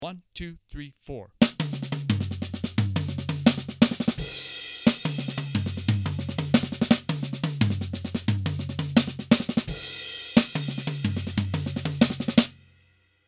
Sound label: Melody Kit